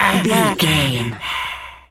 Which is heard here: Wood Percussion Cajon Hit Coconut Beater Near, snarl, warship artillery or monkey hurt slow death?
monkey hurt slow death